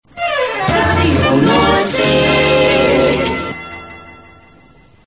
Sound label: jingles